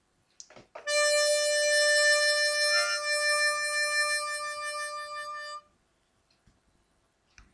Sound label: vibrato